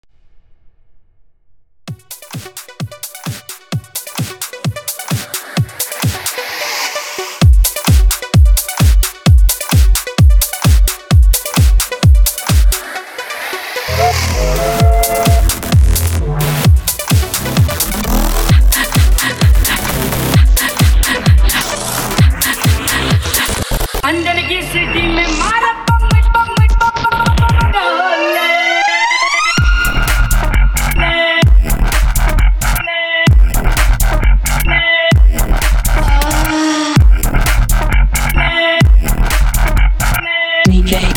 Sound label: dubstep mix